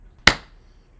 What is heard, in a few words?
clap